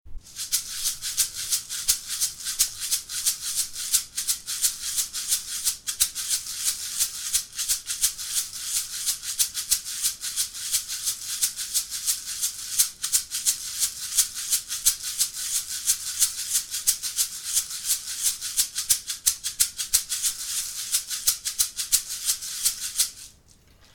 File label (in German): rassel, schamanische rasseln